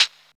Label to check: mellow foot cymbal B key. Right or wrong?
Right